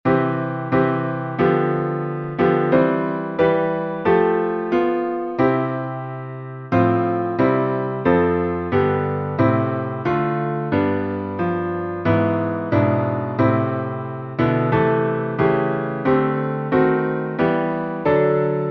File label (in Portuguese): salmo playback